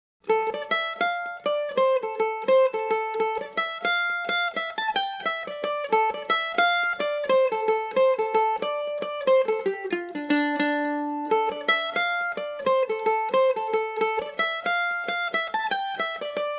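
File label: D minor, irish mandolin, tunes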